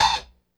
LOFI HAT, LO-FI KITS; Spectrasonics; Burning Grooves CD2

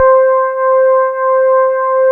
DX PIANO; East West; Twisted Textures-BT

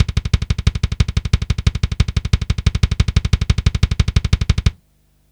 Kick Loop, Drum & Bass

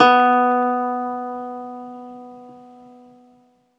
ZITHER; Alpine Volksmusik

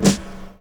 SD, Dance Mega Drums; Best Service

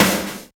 SNARE TEKNO; Roland L-CDX-01; Snares 2; Sn Modules 2

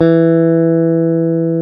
GTR, Dual Guitar; Club-50 - Foundations Roland

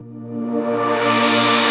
Reverse Synths A#maj, Effects; Vengeance - Essential Clubsounds Vol.4

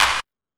Techno H; EMU XL-7

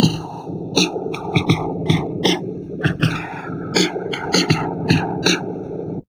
DIST, Drum Loops Crazy Processed

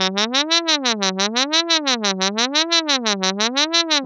Noise, LFO & Noise

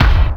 TURBO KICK

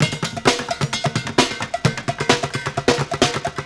Street Groove, Ambient Grooves

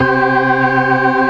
organ, all stops; Keith Emerson Signature Series

